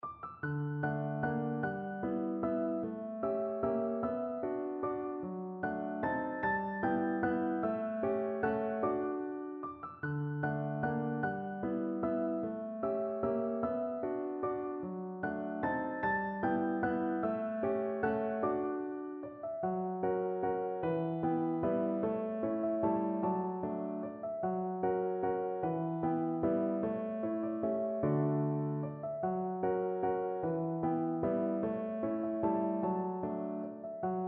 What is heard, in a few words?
german dance, piano